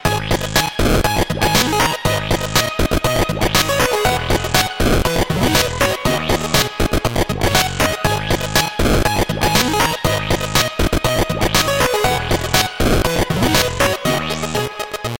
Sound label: Piano Instrumental